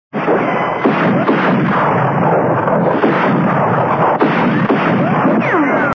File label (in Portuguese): tiroteiro faroeste; efeito sonoro tiroteiro no faroeste